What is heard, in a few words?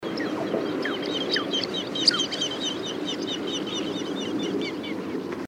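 common shelduck wing sound flight call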